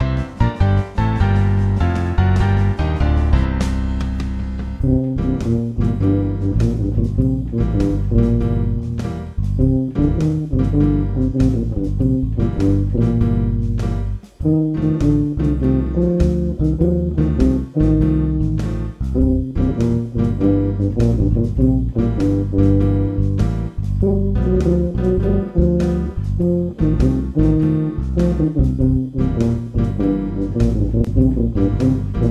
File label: blues, tuba